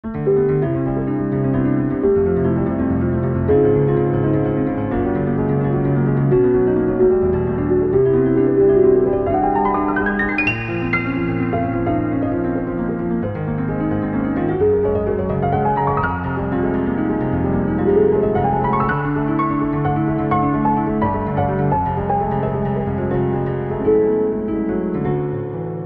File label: jamming; pivotuner